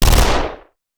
Machine Gun; Sound Ideas; Guns

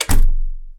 DOOR Apartment Close; mono; Universal Sound FX; DOORS & GATES

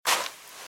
Footsteps - Sand - Dry - Walk - Left

